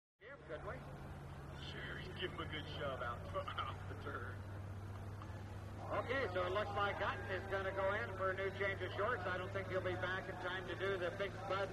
Monster Trucks; Jumping Ambience, 4x4 Pickup Truck Jumping And Racing Thru The Obstacle Course, Crowd Cheers And Applause; Shot M / S From The Obstacle Course.